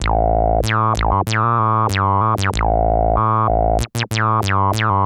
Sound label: 303 95 A, bass; musicradar; bass boutique